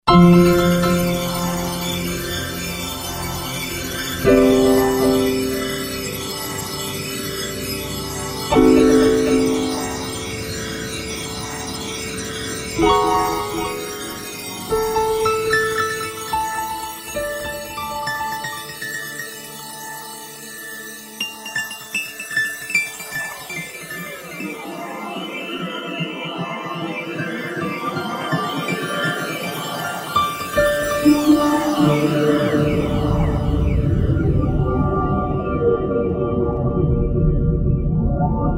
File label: Roland V-Synth Spectra Piano; Factory Patches